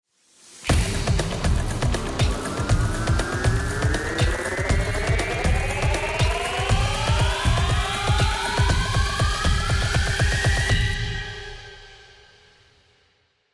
bomb ten sec count; csgocl; musickits

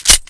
slide back, deagle

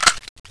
aw50 clip out, weapons

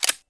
glock clip in; csgo

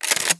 nova bolt, weapons; csgo; m3

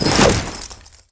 spin draw, deagle

